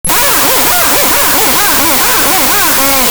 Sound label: Squeeze Toy; 1001 Sound Effects; Foley